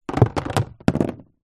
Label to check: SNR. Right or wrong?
Wrong